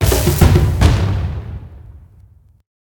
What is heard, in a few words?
fire; smite; raijin